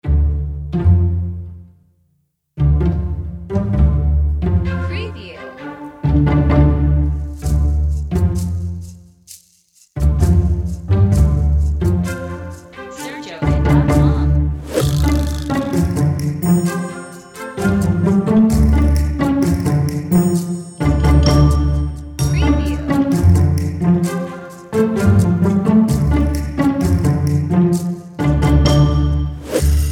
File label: Comic Investigation Children Music